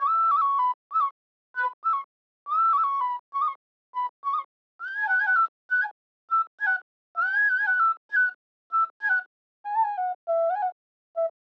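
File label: Trap Native American Flute E major 100 BPM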